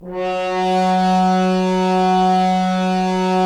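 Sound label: Solo French Horn; Roland - LA Composer vol. 4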